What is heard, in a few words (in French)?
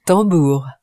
tambour